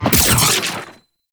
Hourglass Pickup; Warframe; Duviri Murmur

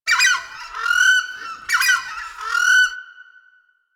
forest bird group; Ambience; Warframe; Grineer Forest

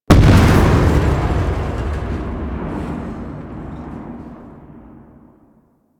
Air Bombard Explosion; Warframe; Venus; Venus Air Bombardment

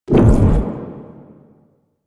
Omicrus Proj Hip Impact; Warframe; Quests; The New War; Weapons

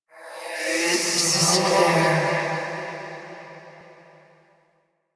Hand Compass Vocal; Warframe; Duviri

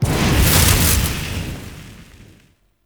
Forcefield Border Start; Objects

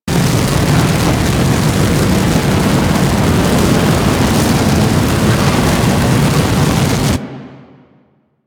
Fortress Flame Loop

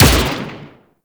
Arch Dual Pistol Fire, Weapons; Warframe; Archwing

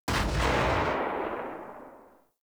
Protea SMG Tail B

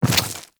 Small Land Dirt, Footsteps; Enemies; Warframe

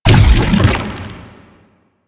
Octopede Lash Limbs Beam Stop; Warframe; Man In The Wall

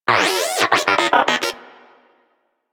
Moa Inquisitive Vocal Greeting; Warframe

